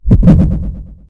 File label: heartbeat